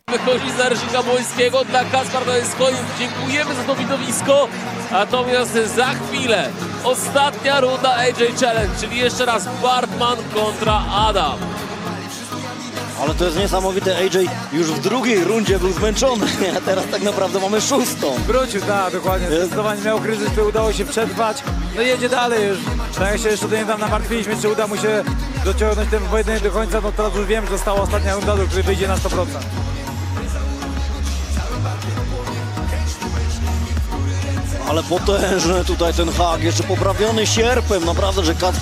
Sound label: Dm 100bpm 440hz